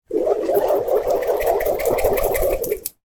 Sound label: foley shoelace or string spin whip through air; sound effects one